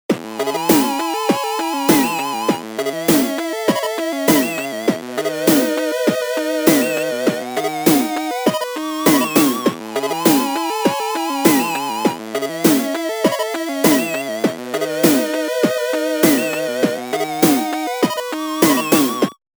Zoom AR 48 8 Bit

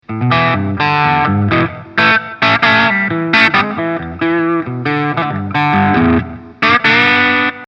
full gain; NTS

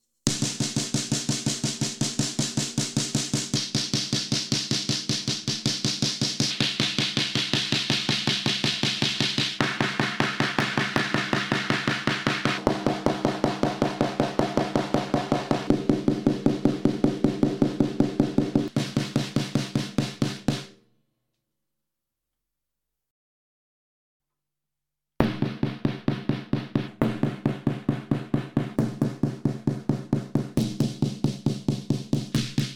Snare 73EQ Mid; Heritage Audio Channel

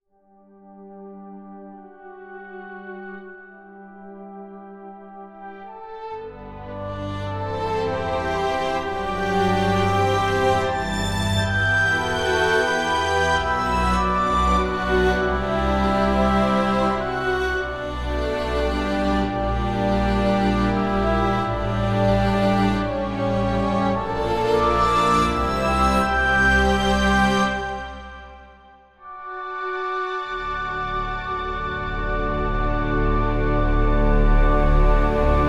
Scoring orchestra

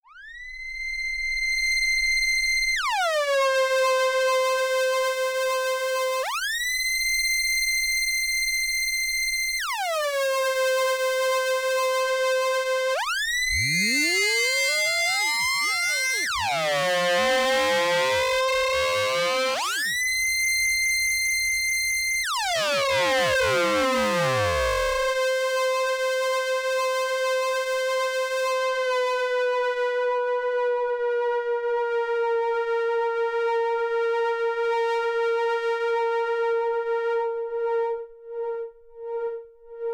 String Ringmod

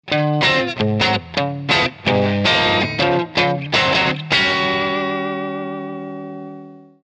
Les Paul clean Warm 4x12

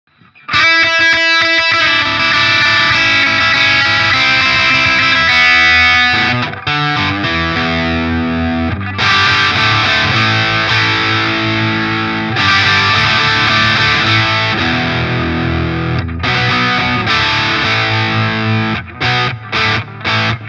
Small Box Overdrive Low Gain, Gain 12h; Friedman

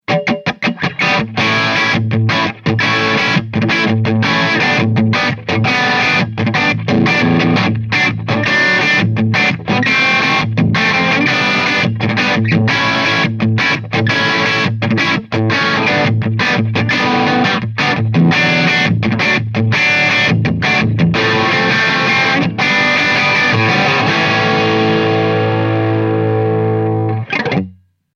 Koch JUP45 H Hot Channel Crunch

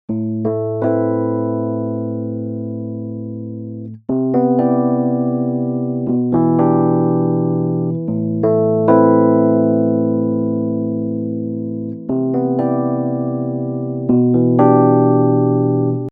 RND Portico 5043 rhodes plug dry

